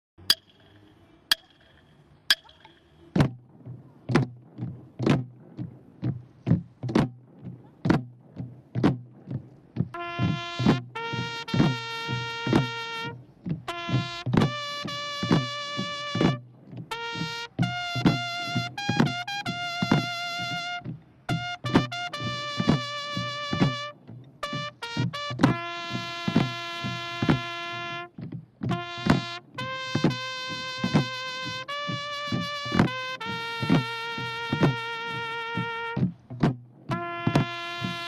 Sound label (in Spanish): Tambores y toque de oración; español